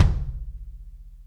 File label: kick